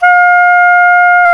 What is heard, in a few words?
SAX SOP MF F, Soprano Sh; Roland LCDP07 Super Sax